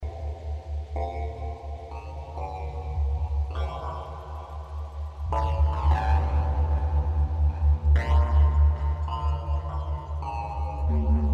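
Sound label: fx flanger, synth; Alesis qsr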